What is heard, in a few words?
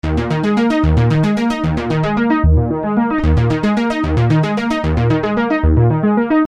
Arpeggio 150 D#, Techno Synth Loops; Sonic Foundry (Sony Creative Software); Signals I